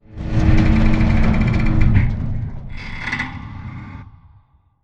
crane move stop metal stress; Titanfall 2

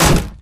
bullet heavyballistic titan; Titanfall 2

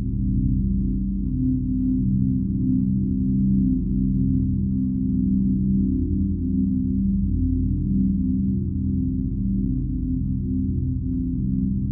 amb int success deck; Titanfall 2

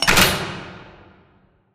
Dome Wall Open Screen Start; Titanfall 2; Boomtown

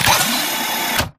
Mvmt Servo Head pivot Long; AI Stalker; Titanfall 2

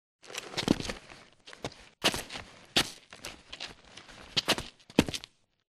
mvmt; Titanfall 2

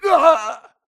grunt death quick; Titanfall 2

